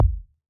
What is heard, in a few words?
Ball Collision Geo Tackleball Light